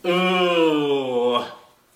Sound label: eughhhhh